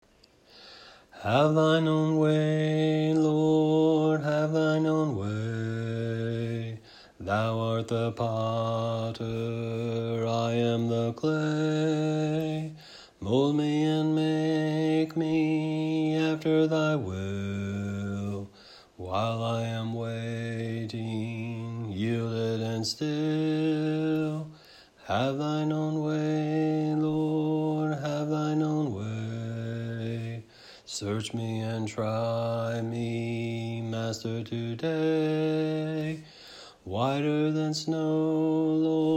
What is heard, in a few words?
Have Thine Own Way Lord BASS